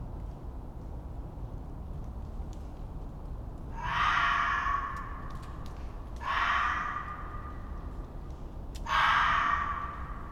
Fox Screaming In Winter Forest In The Night

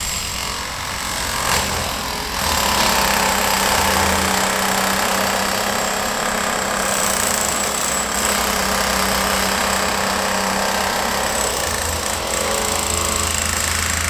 Drilling Away Stone, Bremen

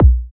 Club Kick

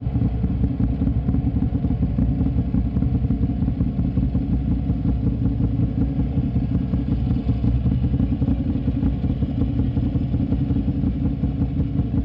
Ducati 696 Monster

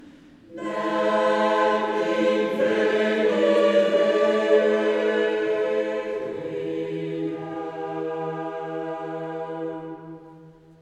Choir Nec Invenit Requiem

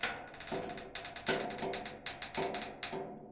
Long Metal Pings

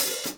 Open hihat

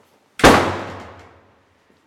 Bang

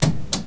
Fuse Box Klick

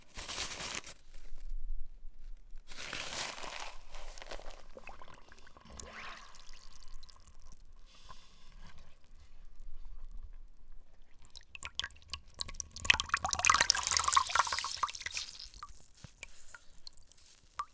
Water Squeezing paper towel Wood bowl

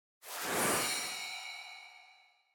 Shining

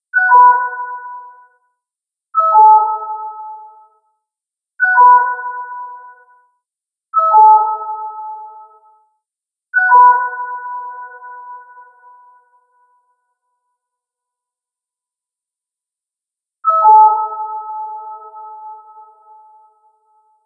Soft Attack Alert

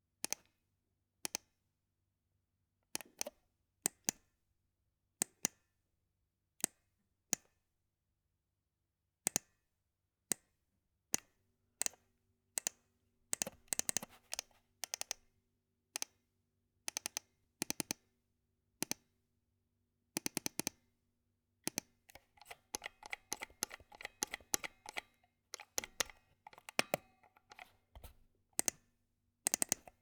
Computer Mouse Clicks And Mouse Wheel

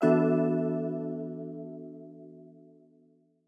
Chord Alert Notification

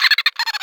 Bug

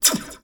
Organic Laser Shot

